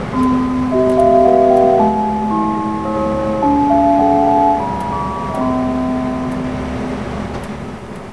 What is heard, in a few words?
chime; JR東日本255系の自動放送チャイム